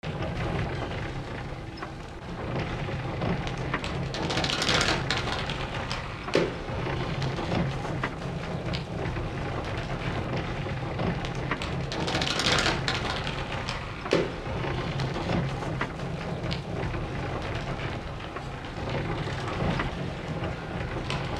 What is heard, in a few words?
rustic windmill creaking